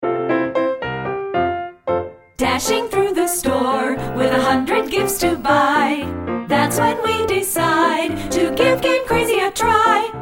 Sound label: Jingle Bells; Portland